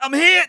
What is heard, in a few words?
wounded; The Sum of All Fears; English Voice